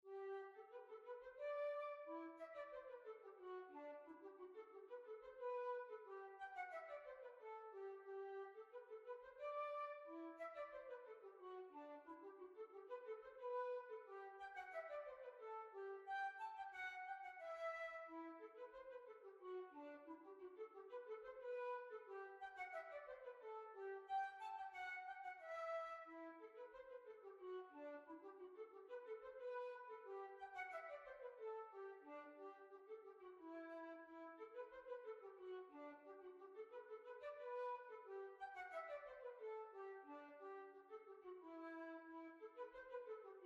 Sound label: Hornpipe; Tumblers Hornpipe